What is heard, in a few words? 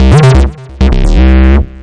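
tb303 acidline, 130 bpm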